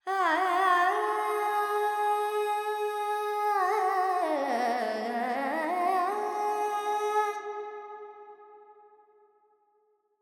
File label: Verb Dorian, Vocals, Carnatic Female, Alaps, 70 G